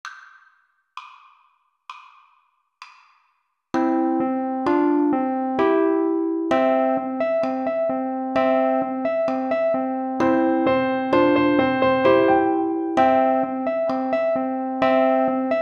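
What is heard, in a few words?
ukulele melody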